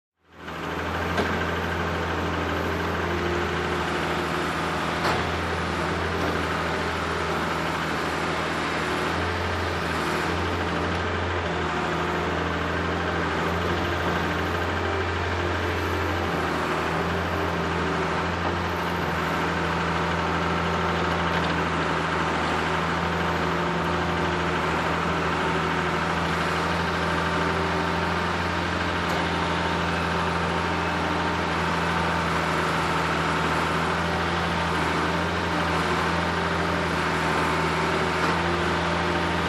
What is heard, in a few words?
Construction Portland Mixer Engine On